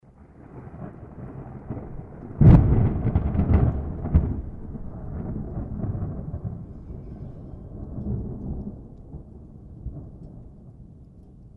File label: thunder